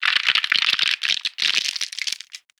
ice; افکت صوتی

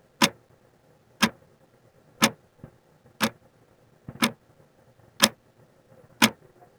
tiktok clock

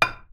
metal

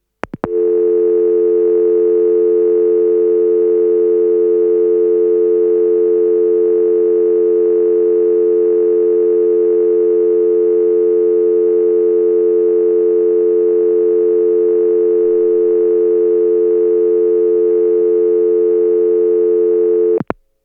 Phone busy beep

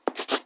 Drawing with pencil and marker

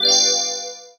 notification mobile

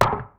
Hammer; افکت صوتی

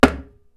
wood blow

